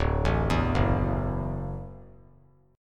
D-Major Add 9th D down; arpeggiated; JGuitar

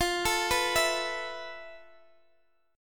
E Suspended 4th F; arpeggiated; Esus4/F

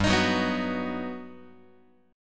G Augmented Major 7th, Guitar

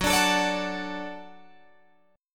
F sharp Minor Sharp 5th F sharp Guitar Open G

